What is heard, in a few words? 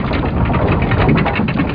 chain plat; Hexen2; plats